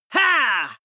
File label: male fight shout; vocals; comedy-cartoon; soundpool